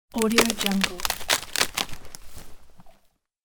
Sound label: rotten tree break crack